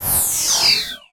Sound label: alien interface sci fi transition; BluezoneCorp